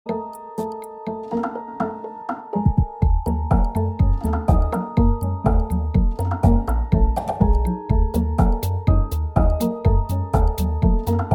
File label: piano